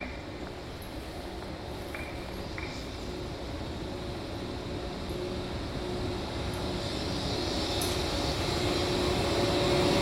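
arrival of an Intercity train at the station, Italy; chivitanova marke v italii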